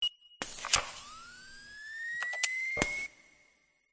tek science fiction tech machine nano start up blow out; Audio Mangler